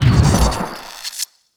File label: mohole discharge